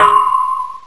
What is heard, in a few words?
Pole